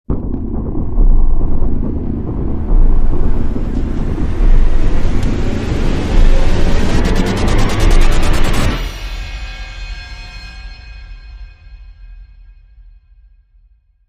Crime Sting

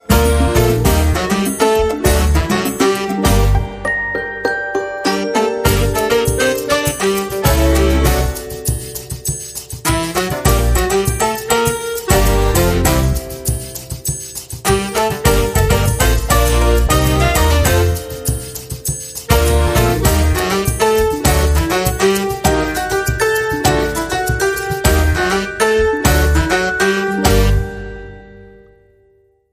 English Samba

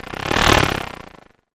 Course Grit Sweep

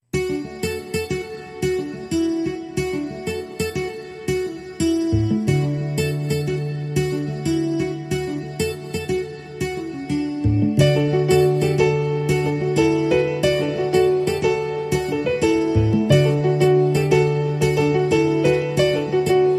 Firefly Rhumba

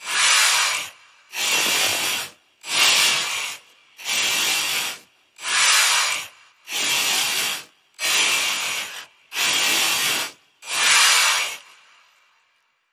Goblin Breaths Pulse

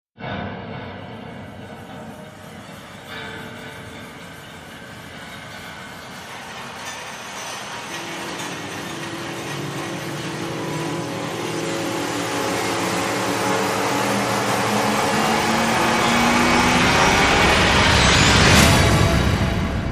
Rise; Panic

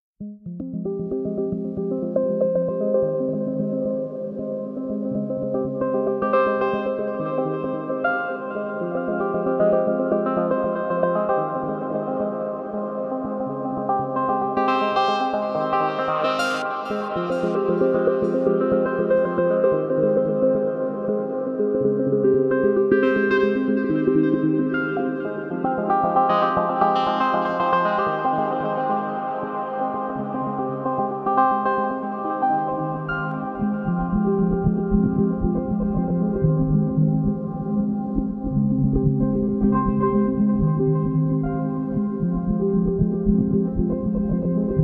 Underscore Arps & Bells

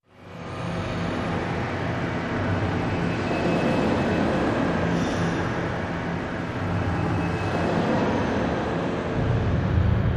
Hindered Spirits Ambience

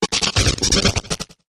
Garbled Sputter

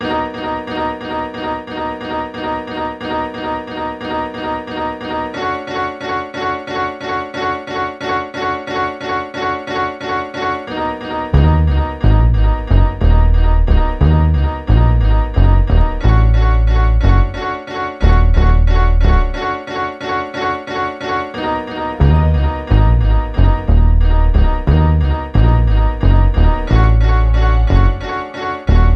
No Perc

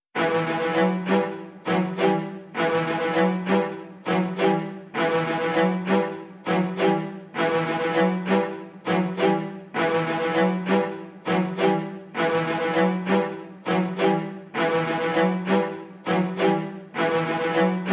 Strings Mix

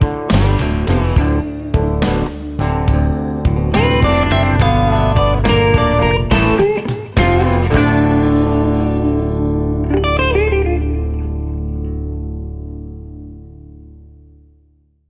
Muddy River Blues

